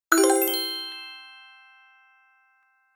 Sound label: reveal card